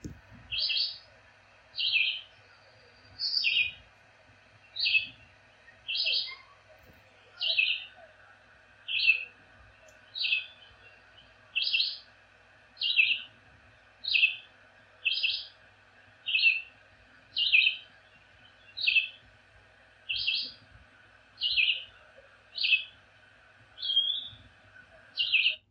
Red-eyed Vireo

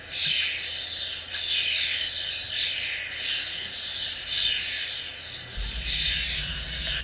European Starling

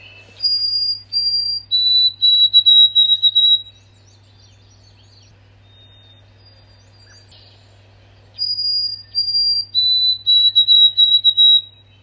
White throated Sparrow

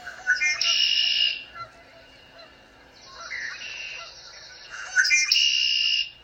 Red-winged Blackbird

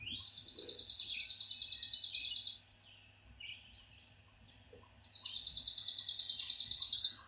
Chipping Sparrow

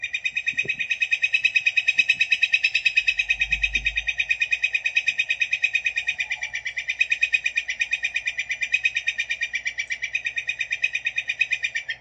Northern Flicker

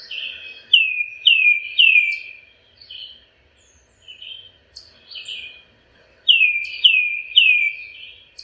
Tufted Titmouse

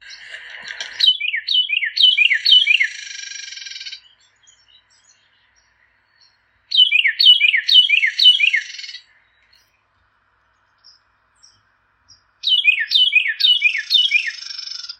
Carolina Wren

